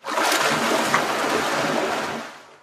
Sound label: croc roll; minecraft; technic